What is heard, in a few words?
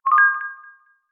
new user connected; Alcatel; Pixi-3; WifiTransferApp